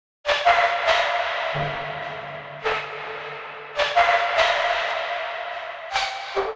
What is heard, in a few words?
Lead A Minor 137 bpm; Ableton; Factory Packs; Skitter and Step; Night Tube